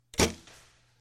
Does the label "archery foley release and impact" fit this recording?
Yes